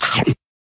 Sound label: Munch